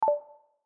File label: notification